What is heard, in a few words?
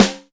Snare, Alesis DM5